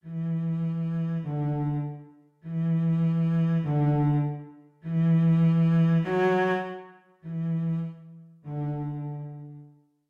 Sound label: Cello Sight Reading Exercise; Whole Steps; SightReadingMastery